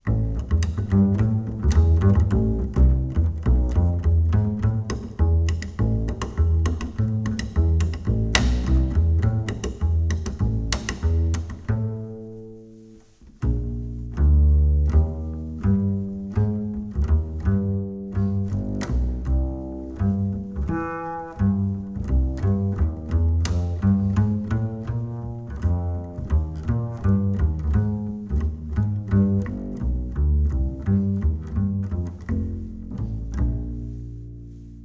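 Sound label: acoustic bass Plucked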